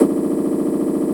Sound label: Kick Verb